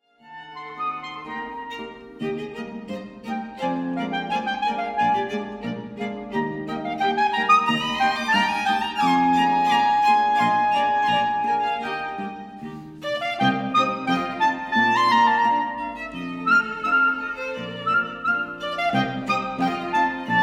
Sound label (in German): Polka, schrammelgeigen; Zaepperl Polka